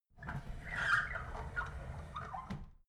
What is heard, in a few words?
drawer big squeak close soft